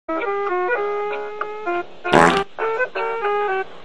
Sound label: indian music fart